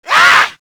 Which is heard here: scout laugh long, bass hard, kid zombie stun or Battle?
kid zombie stun